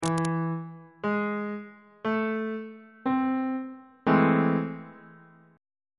g double sharp minor major 7th 2nd inversion on bass clef